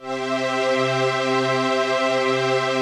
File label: CHRD PAD; Best Service; XX Large Pads; Sample CDs